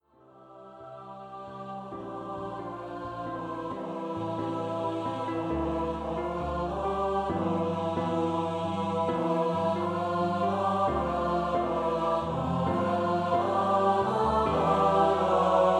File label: 2or3 part song for SAB Choir